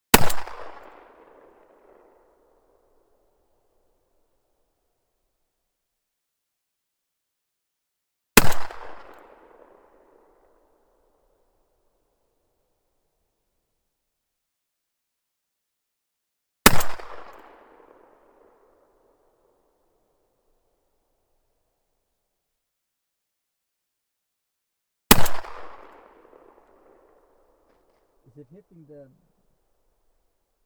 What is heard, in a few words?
SPAS-12 - FIRING - Semi Automatic - 3m Behind Left, Combat Shotgun; Pole Position; Franchi SPAS-12